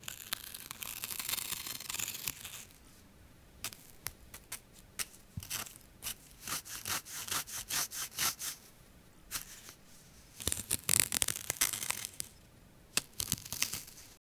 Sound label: soft slicing of the leaf; gentle